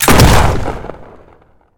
Gun Shotgun Shot; jasem just another shooter with electronic music windows 2017

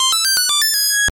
Boot; Next Space; the arcade

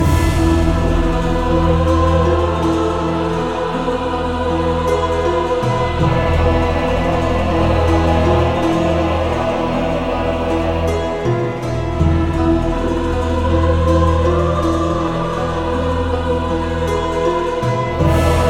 Ambient Loop; The Temple; Prince of Persia

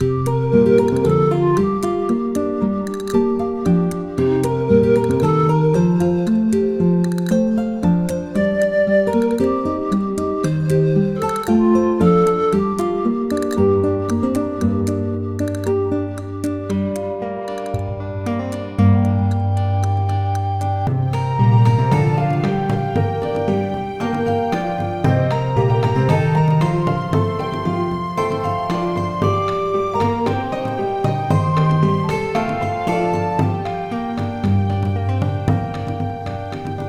Sailing

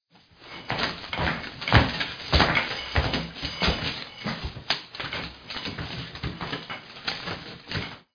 Footsteps; five nights at the chum buckets

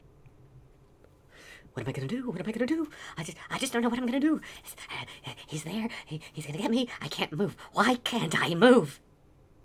Male Idle

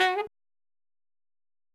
BEEP; Army Men Sarge's Heroes

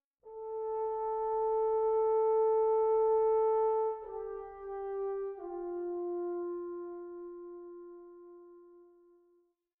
85bpm; Legends of Pegasus; windows gamerip 2012

